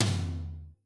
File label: Toms, Yamaha RY20